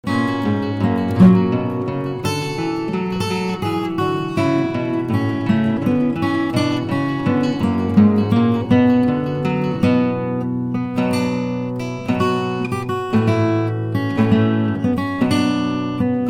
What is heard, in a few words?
greensleeves duet, guitar